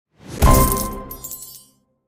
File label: Coins